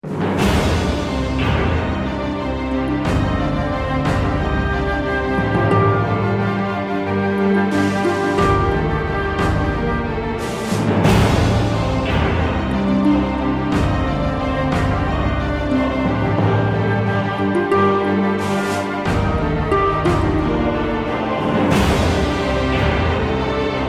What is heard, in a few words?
dramatic